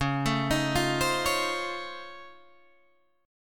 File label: Db Augmented Major 9th, Guitar, Open D